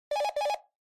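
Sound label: Handheld Transceiver Signal Receive Call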